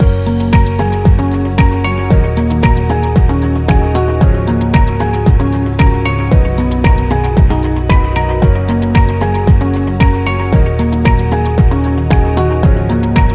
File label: Corporate Positive Loop; service business